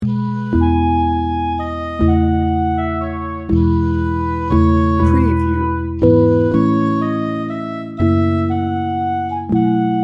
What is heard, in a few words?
it came upon a midnight clear